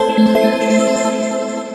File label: music transistor, notifications; Samsung GT i7680